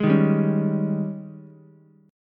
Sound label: G sharp Major 7th Suspended 4th, Ukulele; G#M7sus4 strummed; JGuitar